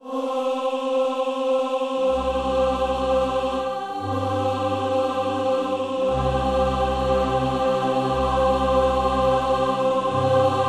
Overworld (Choral Resynth)

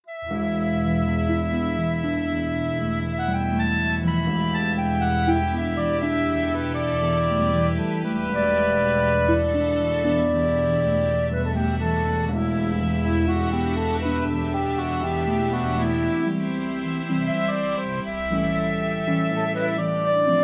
Cademia Woodwinds

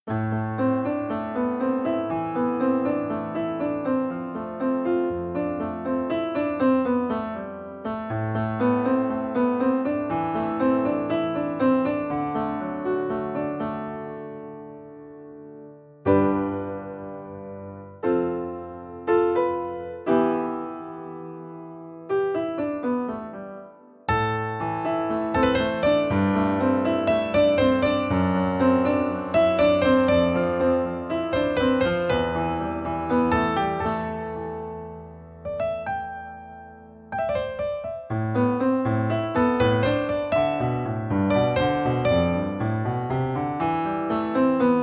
Piano

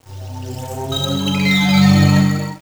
Strange Device

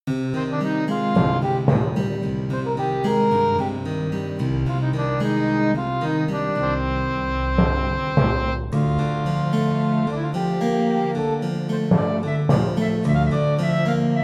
Danger Harpsichord

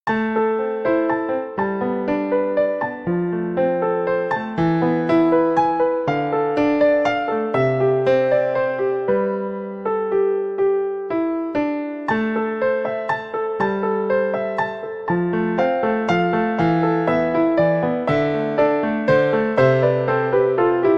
Piano Solo

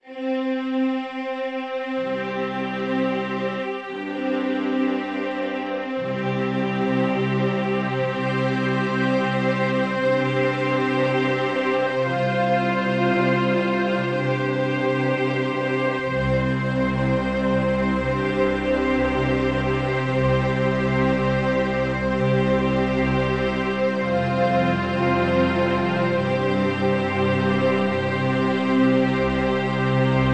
Strings Alternate Resynth

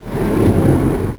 Door; Seldane

